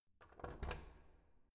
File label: door insert key